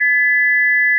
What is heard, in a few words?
2000 Hz plus 1720 Hz 280 beats per second; (100% of Critical Bandwidth)